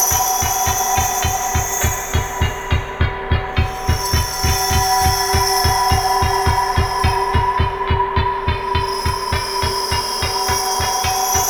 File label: Spinning Dial Small Loop; Ambience; Props; Warframe; Entrati